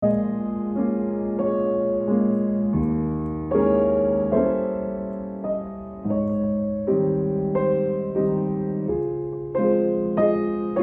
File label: piano